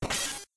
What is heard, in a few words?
splash; Super Smash Land